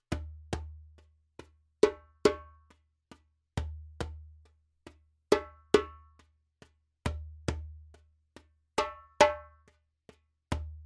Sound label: djembe; djembebog oevelse